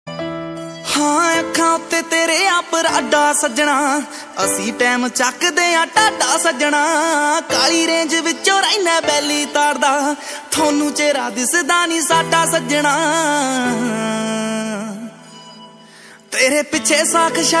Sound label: Song Ringtone